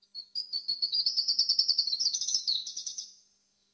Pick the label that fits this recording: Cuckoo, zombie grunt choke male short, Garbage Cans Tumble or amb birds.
amb birds